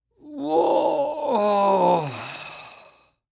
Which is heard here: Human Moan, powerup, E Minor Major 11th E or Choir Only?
Human Moan